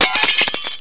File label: GLASS